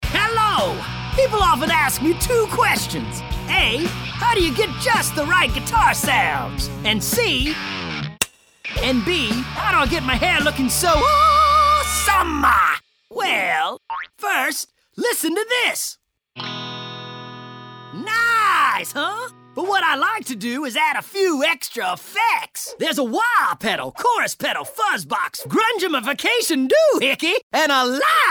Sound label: Guitar Sound